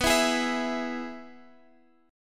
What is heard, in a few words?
E-Major B